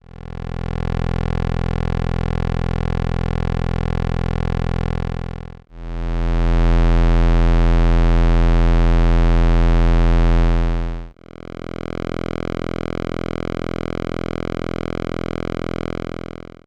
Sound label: Pulse Width Variations